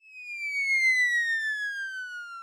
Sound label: fall; mario rpg